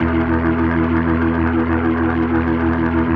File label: E1F, B3, Vintage Keyboards